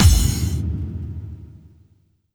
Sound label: BD-FX, Dance Mega Drums; Best Service